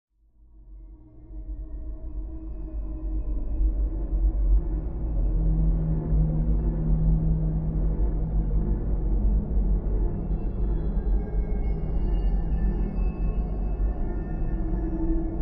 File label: Living Drone Slower Amb, deep